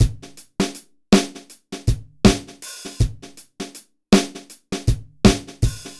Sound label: BPM 80 AMB